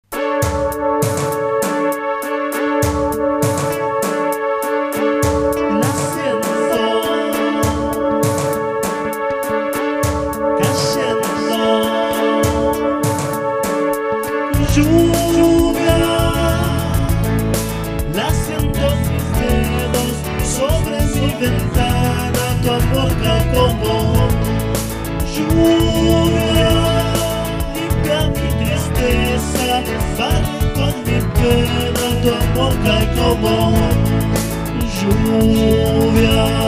Sinusoidal Rain

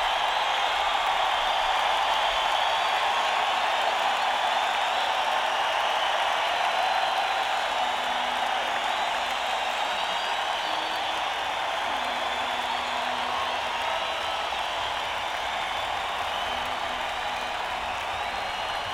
Encore Break; neumann

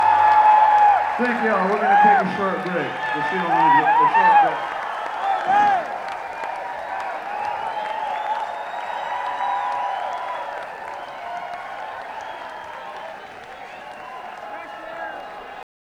end of set thanks and applause

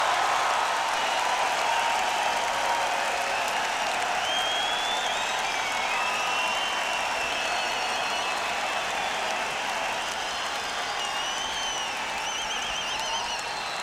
Encore Break; senn me80

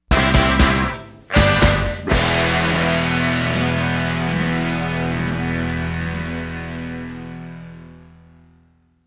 blue jean short music

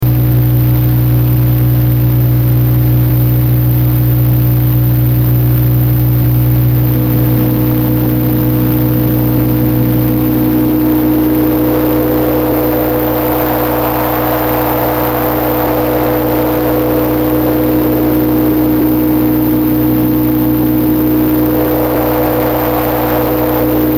buzzing fieldmouse; RawCotton; eucci

